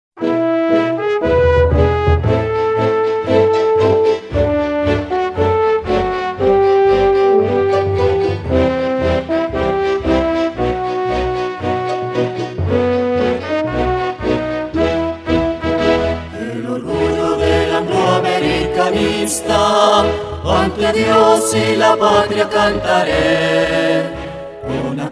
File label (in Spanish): himno; angloamericano